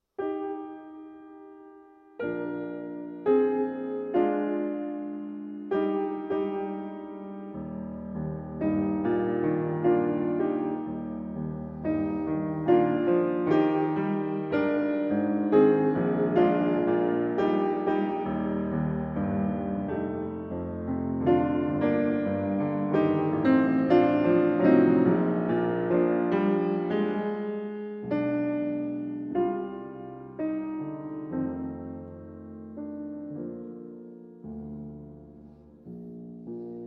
Violin Sonata, G Major; Brahms; Violin Sonata No. 1; Op. 78; II. Adagio

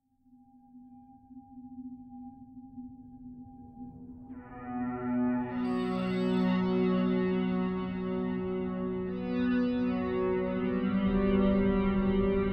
Creepy Space Music

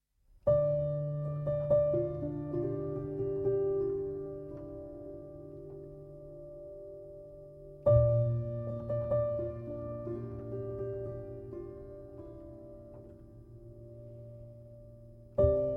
Piano is Breathing

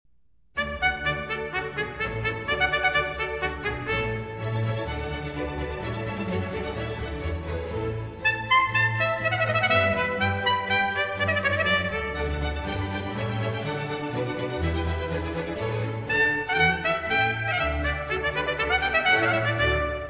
trumpet concerto; Tartini Violin Concerto in E Major, D. 53 III. Allegro grazioso (Arr. for Trumpet in D Major)

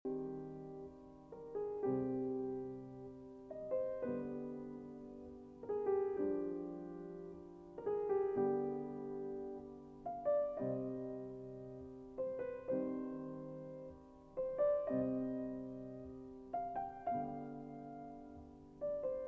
Piano Variations in C, Waltz, Adagio; 33 Piano Variations; Op. 120; Anton Diabelli; Variation XXIX

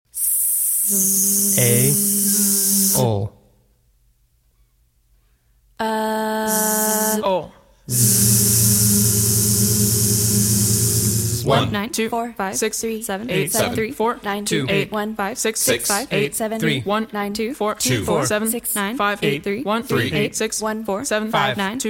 Percussion

